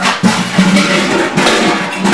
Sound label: PANS